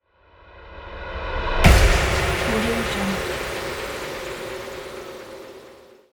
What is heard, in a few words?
fast impact